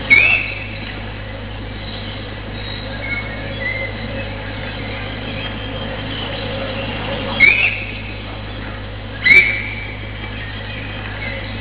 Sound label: alarm